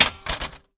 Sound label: Printer, household rhythm samples hits